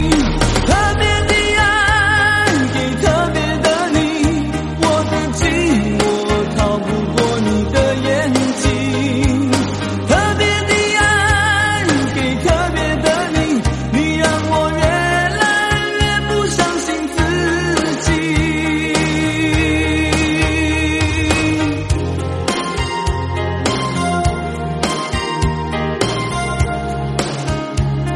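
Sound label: mandarin pop song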